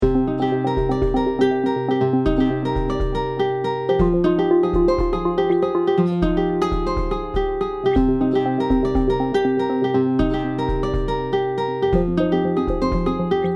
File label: in game background